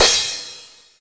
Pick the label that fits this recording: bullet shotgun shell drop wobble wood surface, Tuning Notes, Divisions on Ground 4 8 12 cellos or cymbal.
cymbal